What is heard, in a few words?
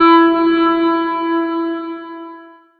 one shot note verb E; grandmother